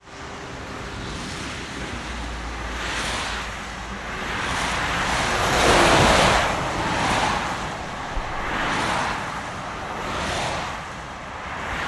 transport traffic motorway passing wet roads spray